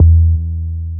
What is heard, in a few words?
DR. LOOP, BASS; X-Static Goldmine 3